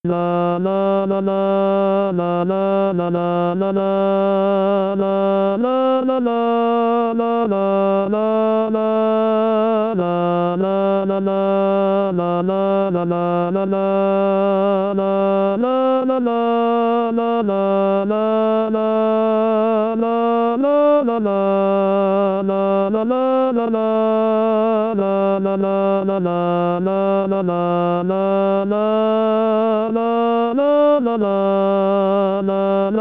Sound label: tenor; Gwir vretonned